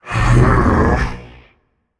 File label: sigh, voicepack; smite; jorm